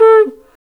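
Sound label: Afro Horn Phrase; Roland LCDP11 Africa VOL-1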